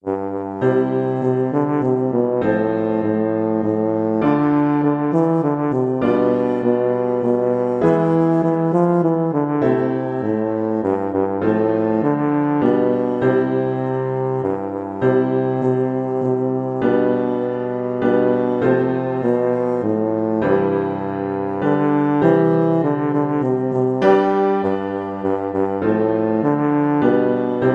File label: we wish, tuba